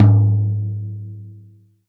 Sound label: J T 2 MF, TOM JAZ